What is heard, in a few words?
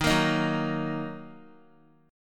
Eb Minor Eb Guitar 4E 4A 5D 5G 5C 6F; Ebm strummed; JGuitar